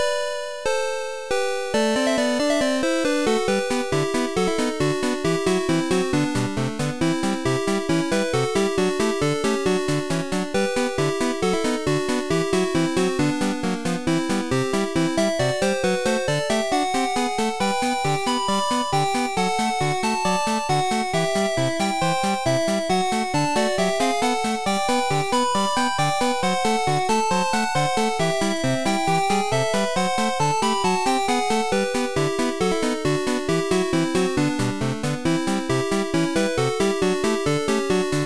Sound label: Cycling, GB Sounds